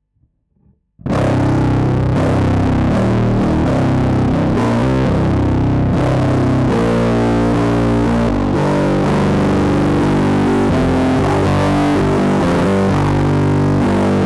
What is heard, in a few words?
muff none Ge trans; diy